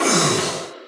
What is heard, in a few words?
Magician Damage